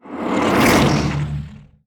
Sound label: Power Blade Release; Umbra; Warframe; Sacrifice; Sacrifice Shrine Intro; Cinematics